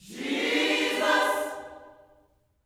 JESUS, Gospel; Spectrasonics; Vocal Planet; GOSP CHORD

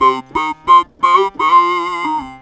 TB PHRASE, Talk Box

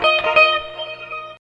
GUIT; East West - Phat & Phunky

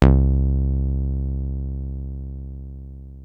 303 C2, TB 303

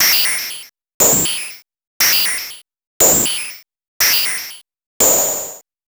MOOG HAT; AMG - Vince Clarke Lucky Bastard

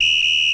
RX21L WHIS; Hollywood Edge; The Big Bad Beatmachine

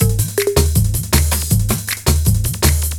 LOOP, Eurotech; Sounds Good

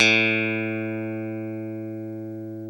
A1 Strat, clean; InVision Interactive; Lightware VOL-1; Instruments & Percussions

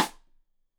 Side Stick Stereo; Deeply Sampled Drums

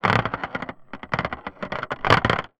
Corrigated Iron, contact mic, Marbles; musicradar; contact mic madness; Raw

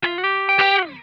GLIDE, Funk Guitar; Zero G - Funk Guitar

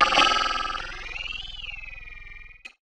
Ring Mod, Cymbal, contact mic; Murf & Ring Mod

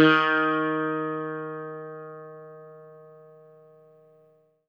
EL SITAR HRD; Quantum Leap - Guitar & Bass

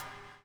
BUBL; Spectrasonics - Backbeat

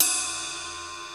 RIDE, DRY; Crypton - DrumBase5000; DRY KITS

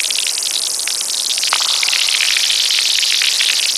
SYNTH RAIN; Zero-G Ambient 1

